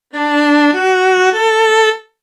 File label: violin